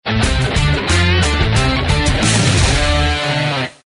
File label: rings ready to rock